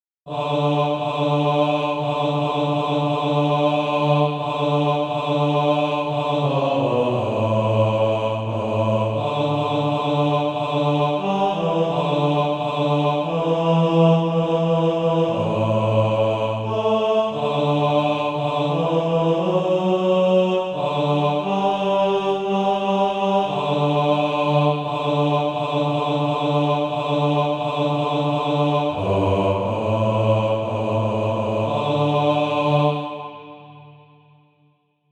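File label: bass, SATB Practise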